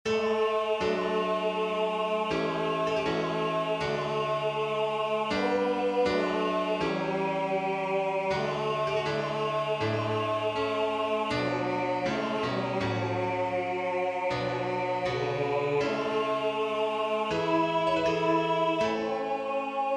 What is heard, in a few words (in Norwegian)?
Pinsesalme T